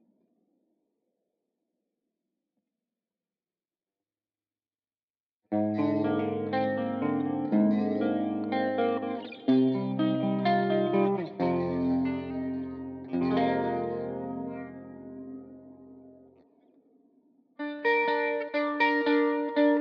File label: Guitar